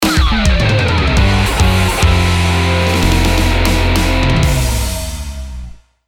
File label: bgm mission fail; Naruto Shippuden: Clash of Ninja Revolution III; Wii gamerip